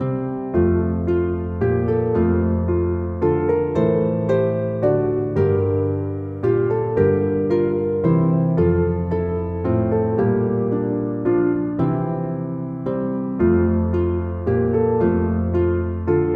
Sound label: Christmas songs music; Away In A Manger; Go Tell It On The Mountain